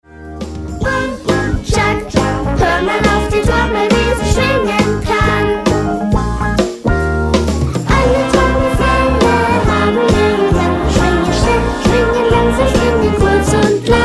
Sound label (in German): Alle Trommelfelle